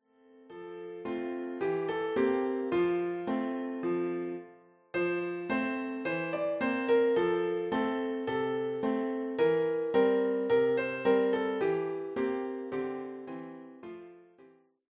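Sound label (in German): Lasst uns froh und munter sein, Klavier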